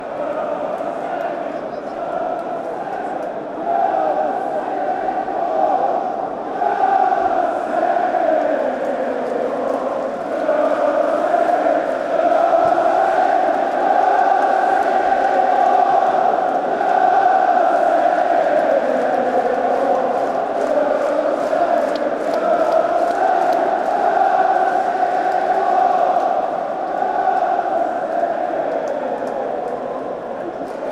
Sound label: youre not singing anymore germany fanchants